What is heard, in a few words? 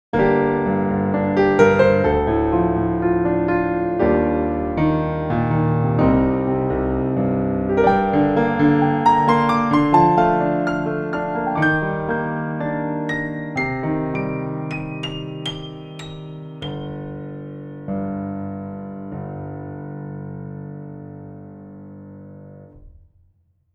VSL 280VC Mikro Mid1